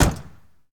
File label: Mustang GT350 Door Close; Automobiles